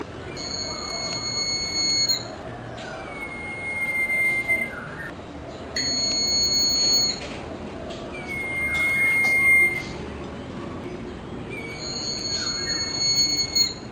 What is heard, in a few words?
song duet; Amar